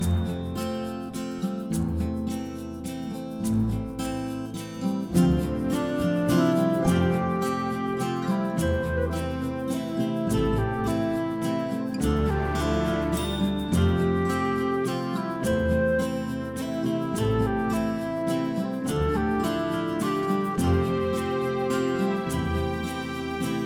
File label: Music Ingame Windy Village Field Intro And Loop